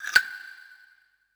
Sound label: perc; eddyflux